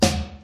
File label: round acoustic snare D key; snare drum samples